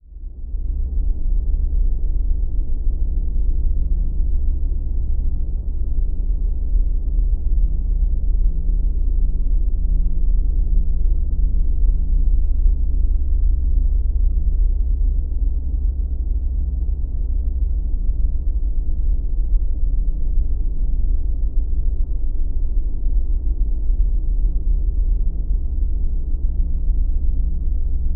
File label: Drone Deep Pulsing Power Source; Production Element Imaging; AdobeCC